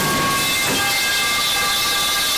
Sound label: metal pipe falling; meme